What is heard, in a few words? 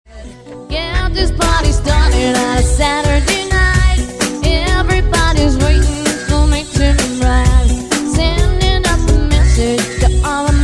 smooth & groove